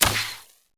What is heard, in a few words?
hornet poison needle